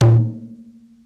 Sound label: rebana